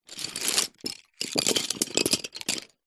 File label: office pencils and pens short movement on surface